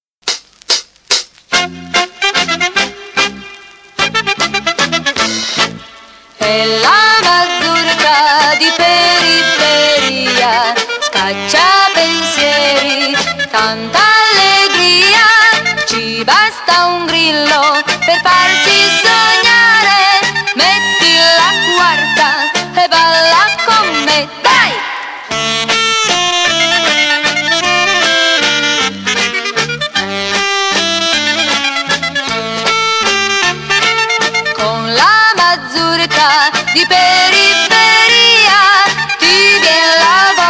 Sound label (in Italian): MAZURKA